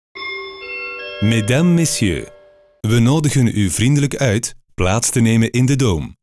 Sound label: eventjingle; febelfin; dome; studio sonart